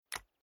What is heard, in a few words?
Sport Hunting Weapon Shotgun Closing Barrel